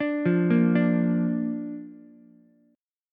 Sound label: A sharp Major, Ukulele; arpeggiated; JGuitar